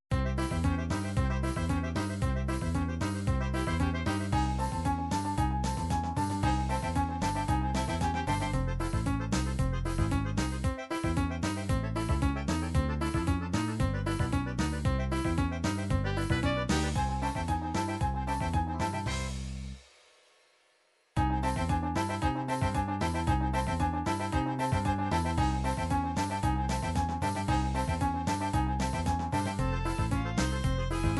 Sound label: forro, midi